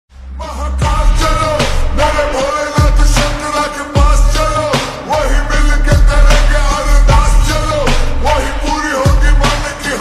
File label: Slowed Reverb Ringtone